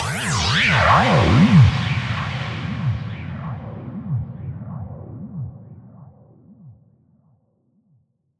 Helium Leak C# 142 BPM, Psytrance Zaps & Filter Sweeps; Ghosthack; Psytrance Visions Volume 2